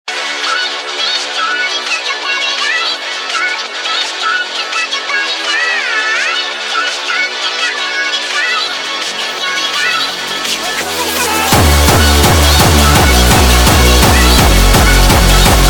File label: hardstyle remix sped up